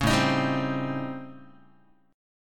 A 7th Sharp 9th Flat 5th, Guitar; A7#9b5; strummed; JGuitar

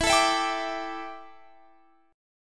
D Suspended 2nd Suspended 4th E

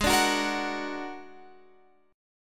A sharp Augmented 7th G sharp

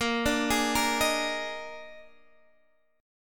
Eb Major 7th Suspended 4th Bb

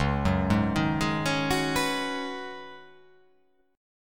Db 9th Suspended 4th, Db9sus4 chord; arpeggiated; JGuitar

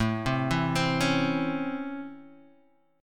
C sharp Minor Major 7th Sharp 5th, chord

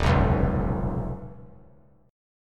Eb 7th Suspended 2nd Sharp 5th Eb; 3,6,4,3; Eb7sus2#5 strummed; JGuitar

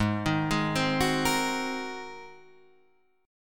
G sharp 9th, Guitar, Open D

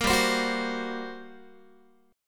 G Minor Major 7th Double Flat 5th, G, Guitar; GmM7bb5; strummed; 13,15,15,16,16,x

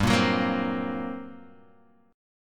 G Minor Major 7th Double Flat 5th, G; strummed; JGuitar

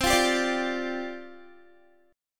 C Suspended 2nd Suspended 4th, down, Guitar

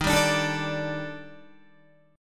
Eb Major Sharp 11th, down, Guitar; strummed; chord

